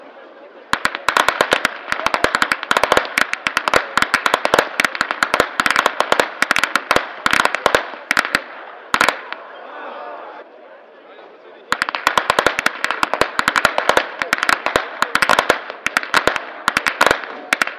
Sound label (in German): schnalzen, flachgau